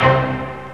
ORCH; Greytsounds VOL-1; SYNCLAV TRAX

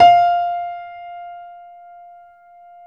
PIANO F5, STEINWAY; Greytsounds - Philip Wolfe David Crozier's Saxophones; GR8 STEINWAY

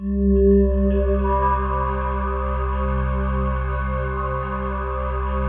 PAD, DIGI-PADS

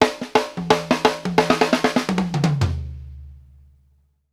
ROUND THE, Drumworks FILLS 84; Sampleheads; New York City Drumworks VOL-1; KD FILLS 84

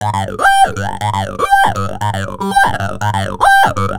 Throatline, Industrial Nasty

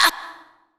Vox Hit C3; Zero-G; Club Techno

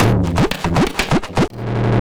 Tabla, 120 BPM; Zero-G; Pure Tabla

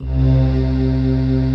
future choir, Band; Optical Media International; Sonic Images Library

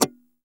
GTR SCRAPE, Clean E.Gtr, Strats; Roland L-CD701; Clean E.Gtr4; PYB Strats

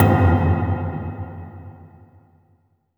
HOLLOW TUB, Impacts, Hits & Booms; Distored Reality

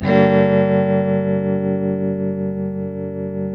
TEL TM D MIN G#, TELE CHORDS, Guitars; Spectrasonics; Hans Zimmer Guitars VOL-1

